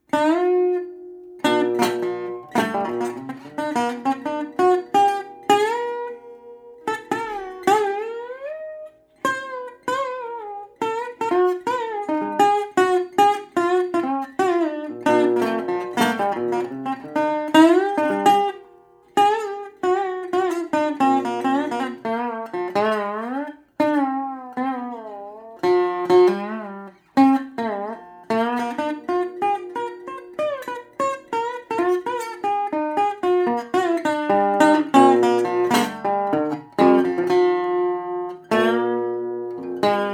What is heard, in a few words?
music of burma; Burmese Guitar